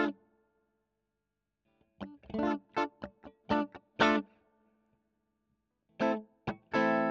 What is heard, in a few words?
E GTR; Royer